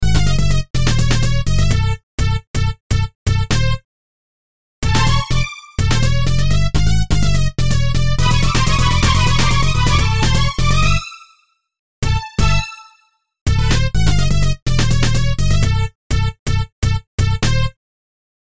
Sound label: BEAT OFFTIME, Beat Off Time Synth; Miscellaneous Loops